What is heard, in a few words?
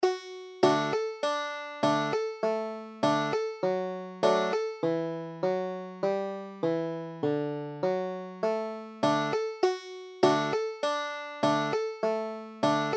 flop earred mule, banjo